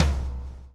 lash, backbeat

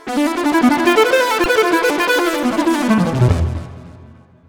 Modal Riff, Keyboards

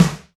Snare, Alesis DM5 Drum Machines

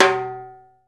RIMSHOT, Timbale; Timbale Battery

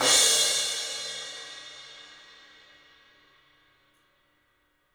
CYMBALS; 3D Sound; 3D Drums

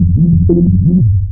OOO; Drum&Bass; fx & sweeps; EZRollers

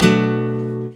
GUIT CHORDS; Best Service; World Colours

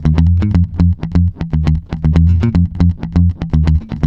F, groover, bass; Spectrasonics; Bass Legends

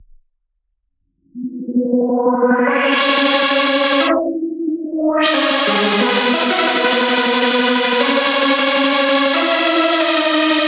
rave arp going crazy

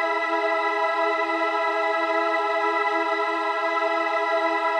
Pad Loop E, shimmer and sparkle

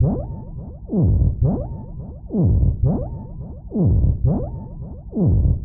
ALARMS; Best Service; Advanced Mega Effects

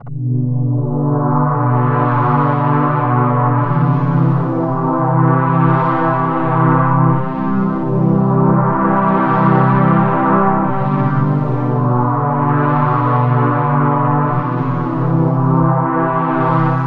PAD1, INST LOOP, House Side Of 2 Step; USB Soundscan vol.51; AKAI

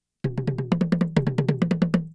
Toy Djembe Toms, Asia; Sonic Foundry (Sony Creative Software); Continental Drift Aftershocks

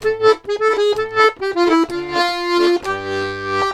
Accordions; USB Soundscan vol.40; AKAI

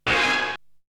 Hit; ZERO G - Datafile DISC 1

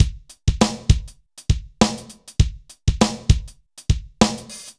dry Loop, BPM 100

